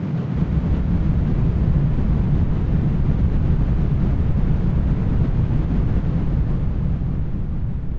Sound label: Distant Thunder FX; Instruments; Laboratory